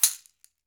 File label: CAXIXI, Latin Shakers